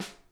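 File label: snare center tap; Sonic Reality; Interactive Drum Kits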